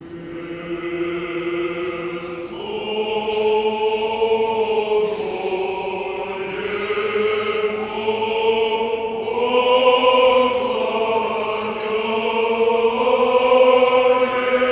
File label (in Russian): Рогожский мужской хор; Вечер Духовных Песнопений 2016; Кресту Твоему покалняемся Владыко; Знаменный